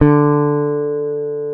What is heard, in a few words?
P BASS SUSTN, D3; Sampleheads; Will Lee Bass Library